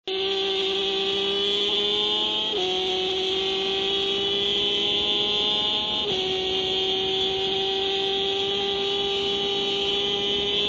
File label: NSR250